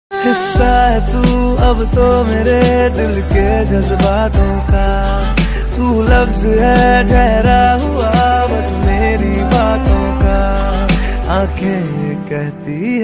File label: Song Ringtone